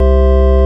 ORG Vox Continental, Keyboards of The 60's and 70's